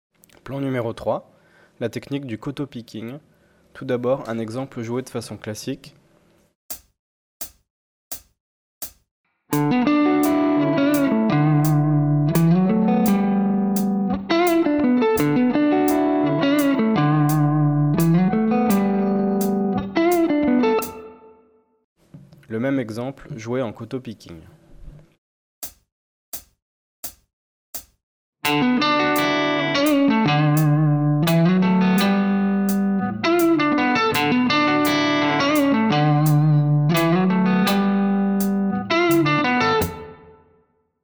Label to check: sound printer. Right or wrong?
Wrong